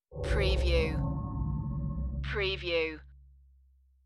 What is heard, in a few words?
Haunting